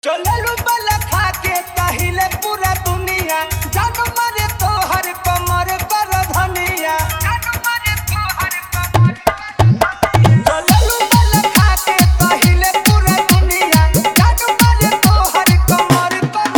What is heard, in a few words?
Full Hard Dhollki Bass Mix